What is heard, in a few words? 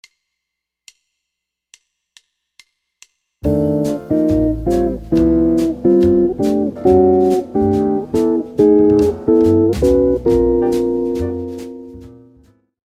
blues subs, jazz guitar